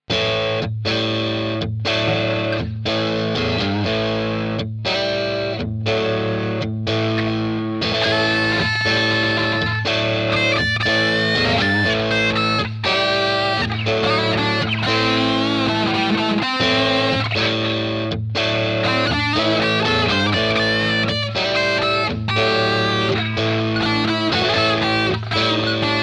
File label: short blues